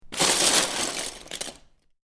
Lego Rummaging In Lego Box; Miscellaneous Toy Sound Effects; Lynne Music

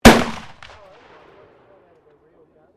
RIFLE MOSIN NAGANT 9130 FIRING; Rifles, military; Guns

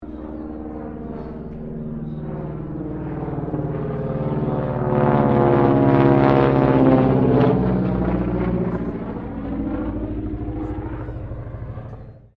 AIRCRAFT AT-6D HARVARD MK III PASS

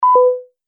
Multimedia Event Notification, beep sound effect

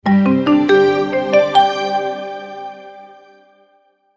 multimedia logo, Musical Identity/Signature

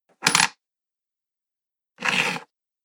Coins Two Sounds, money sound effects

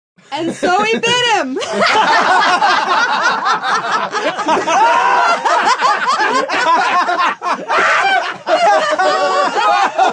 Laugh After Joke, Group laugh; Laughter

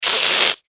Monster Vampire Hiss Creature; Horror; Animal; Monster Sound Effects

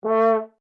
HORN A4 F SHORT, French Horn in F; Alexander French Horn in F; Musical

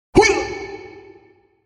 Martial Arts Yell "Whooyaa"; Combat Voice Sound Effects; Human Sound Effect

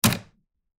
Household Cabinet Door Metal Latch Close; Cabinet Doors; Sound Effects:Doors

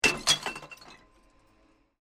Bottle Plink, Glass; Materials

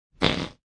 Fart; Farting sound; Human

